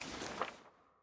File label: sfx ui record next click; jayce